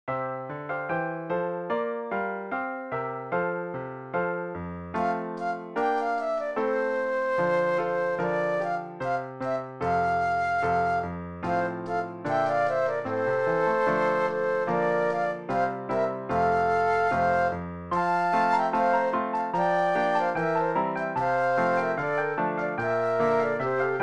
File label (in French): Variations sur Dingdong; da Camera